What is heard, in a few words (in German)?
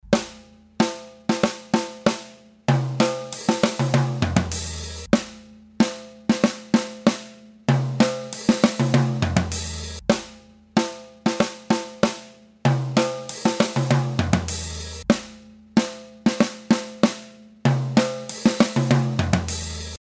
Direktvergleich Snare unaligned aligned; bearbeitet; DF-Nord Drums Handyrecorder